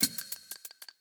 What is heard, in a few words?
propeller finish